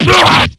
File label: damage; jubei